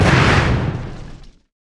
lay waste; lich; karthus

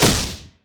dragons rage hit; LoL; leesin; blindmonk

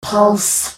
spell cast effort; Oriana

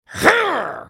effort; Wolfman; Character Voices - English; en US

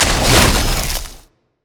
ice shard; LoL; lissandra

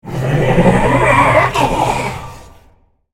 dying; Xerath; Character Voices - English; en US

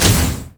monkeyking spin to win hit big; LoL

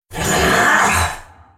Droid spellcast effort

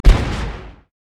tower; LoL

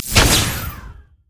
shock blast; LoL; jayce

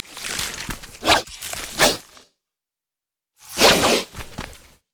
emote dance; khazix

